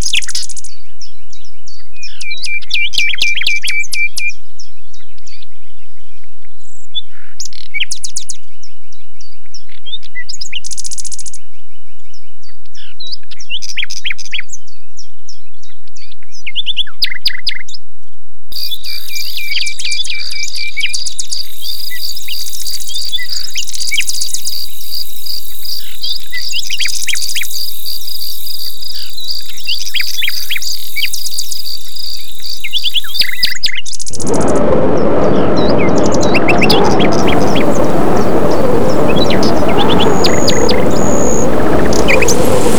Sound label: Sonification of Network Traffic Flow